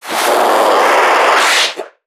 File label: Creatures Vocalisations Infected